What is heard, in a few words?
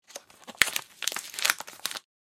Food packaging unwrap ingredients open; paper